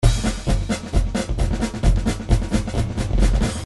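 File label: drums pattern; Paul Kodish Dangerous Drums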